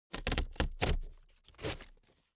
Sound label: object box cardboard big rip open